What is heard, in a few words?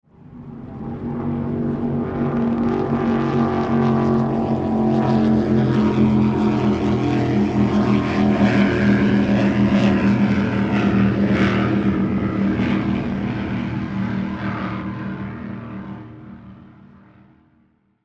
Speed Boat Drive By Distant Watercraft; Speed Boat Drive By Distant Fast; Transportation Watercraft; Boat Sound Effects; Boats (Sound Effects:Vehicles); Blastwave